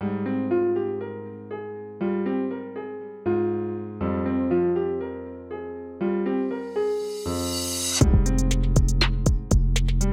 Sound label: Trap Type beat